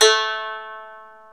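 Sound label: ethnic plucks; Eth Plucks, Pluck Duo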